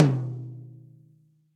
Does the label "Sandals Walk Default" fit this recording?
No